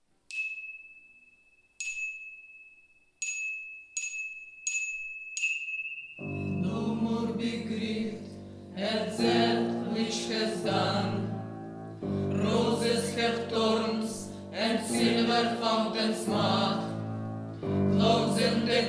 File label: live acoustic